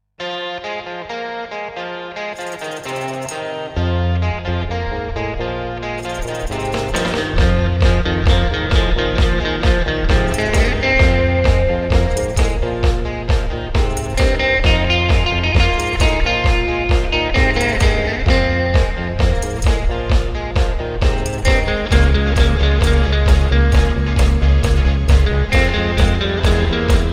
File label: Kicks